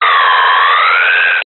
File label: cb radio static interference burst; technology